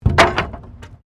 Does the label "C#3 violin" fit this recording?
No